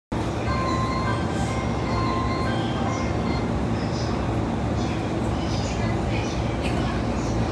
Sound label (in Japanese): 埼京線 南与野 南, train sounds